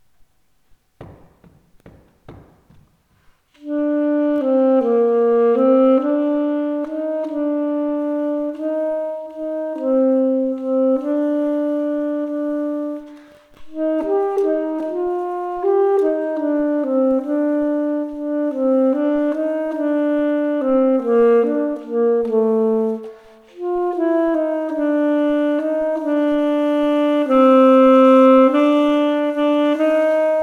spring dance, Skilful Duets Sax

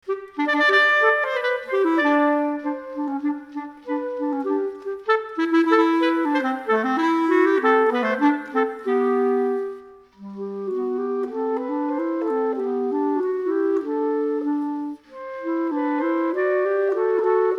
Duet, Skilful Duets

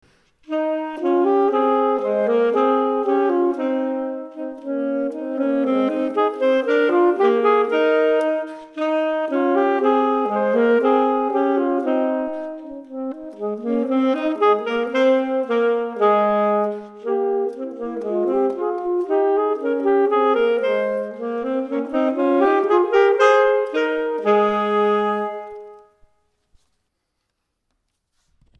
Duet

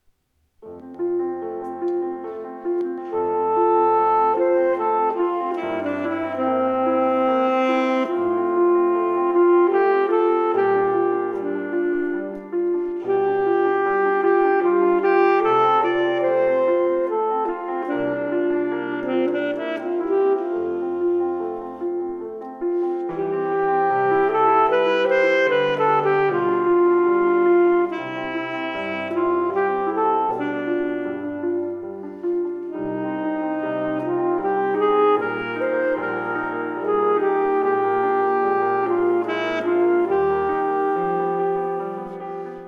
andante sostenuto, Bel Canto

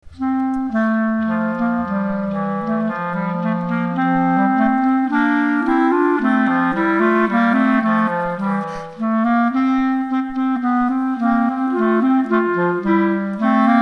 Duet, Skilful Duets

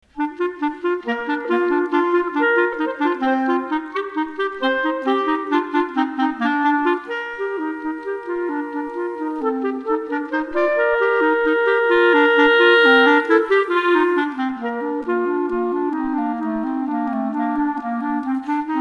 Duet, Skilful Duets

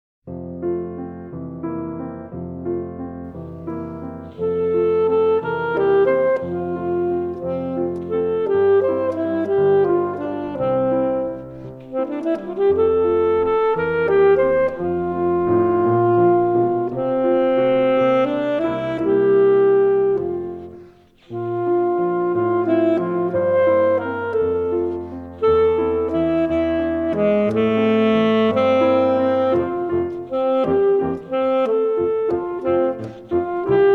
Andante catabile, Bel Canto

